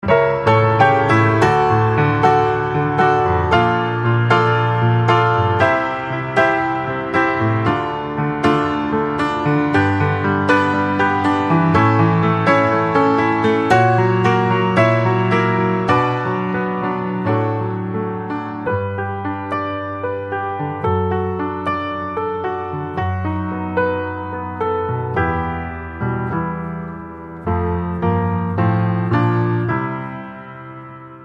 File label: Ringtone Instrumental Alternate